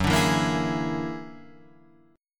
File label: Gb Augmented 9th Gb down Guitar Standard; Gb+9 strummed